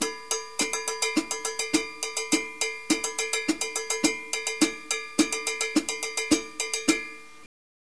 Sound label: clave cowbell